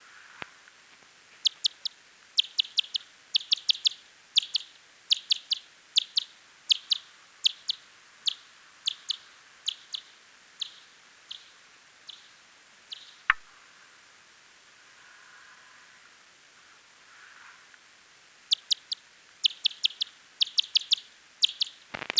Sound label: Myotis macropus